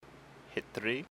hit; blindside

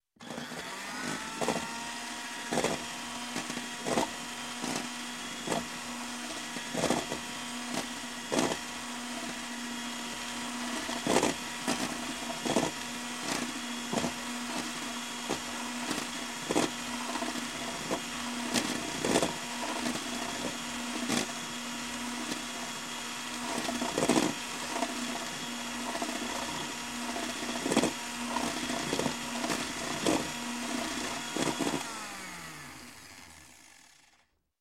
Mixer, Hand Electric Hand Mixer Mixing Liquid; Sound Ideas 2000 Series

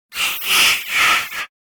Air Scream - Short Pass By Zombie - Stereo Field; Hollywood Edge; sound designer's toolkit v2

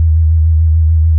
Sci-fi Hover Bot Loop; SFX Kit; Sci-Fi Loops

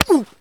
Male Hit By Pitch Baseball

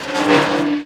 Valve Metal Wheel Turn Squeak; SFX Kit

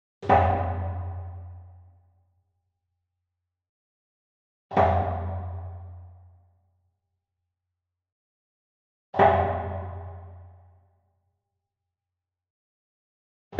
Wood Percussion Big Bom Flam Far; boom library; Cinematic Strikes Construction Kit

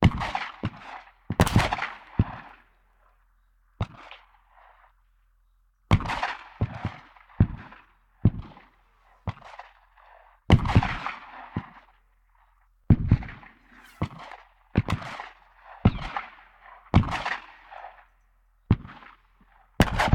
War Ambience Gunfire; Sound Ideas; Guns

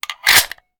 Hand Gun Foley; Sound Ideas; Guns

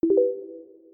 chat receive; Dice World

